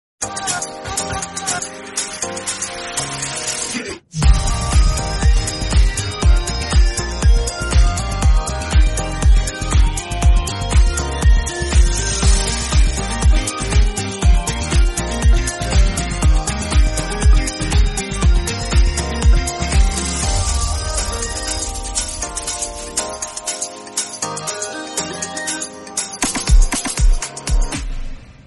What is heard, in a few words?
mobile phone tone ringtone